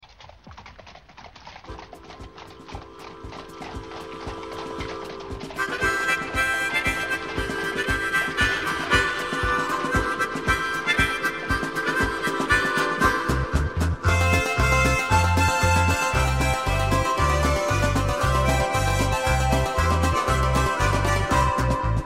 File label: Western Sound